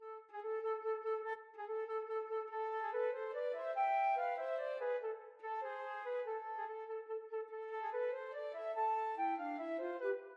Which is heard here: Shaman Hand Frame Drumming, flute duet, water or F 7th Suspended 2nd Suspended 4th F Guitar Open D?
flute duet